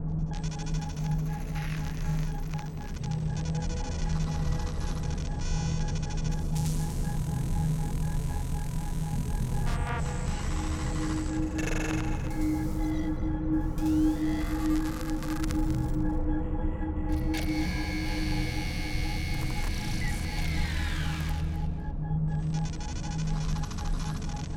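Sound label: lithoid ambient research station signals; Stellaris; lithoids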